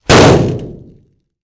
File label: Bullet Impacts Metal